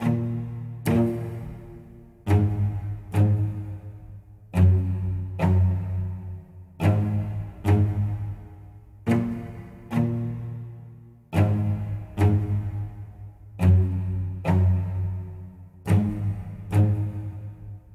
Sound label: Disturbing; BGM; Altdeus Beyond Chronos